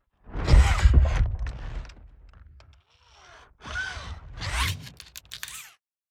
ROBOT SMALL

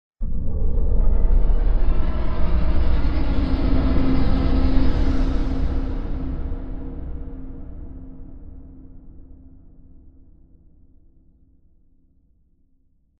Horror

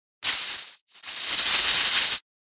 SHORT CIRCUIT

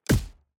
unleash arrow

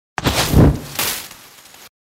Thunder element

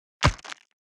BOW hits

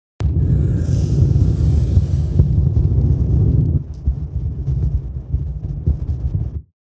Fire element spell

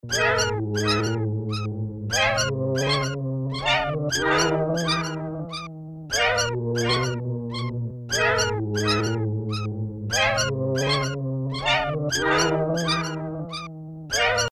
CHORUS

